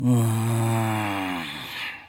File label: mmgggh; Doppo